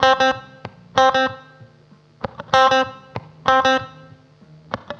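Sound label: RYTHM05, rythm guitar; USB Soundscan vol.31; Miro s Grooves; AKAI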